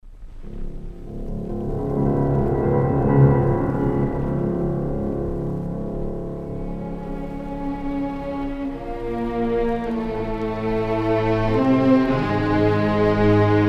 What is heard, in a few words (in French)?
Concerto pour flüte et orchestre; BADINGS; Lento